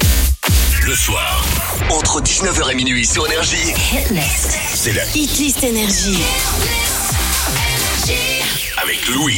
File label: Jingle; NRJ